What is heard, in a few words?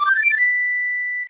flute ringtone; zelda